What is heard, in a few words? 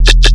BASS ONE SHOT, E